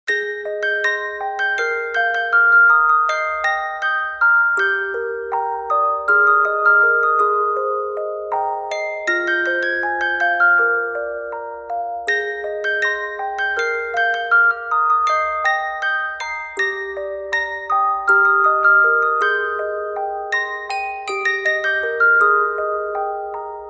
Music Box; BNHA

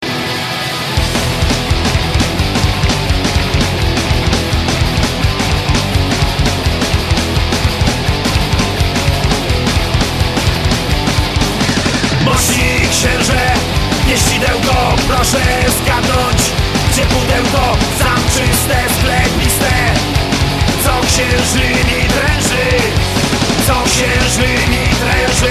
Punk

